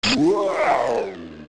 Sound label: Die; Freezzard; zelda; OOT